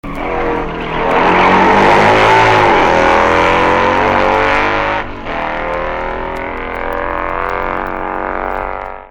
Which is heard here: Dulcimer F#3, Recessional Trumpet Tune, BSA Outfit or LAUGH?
BSA Outfit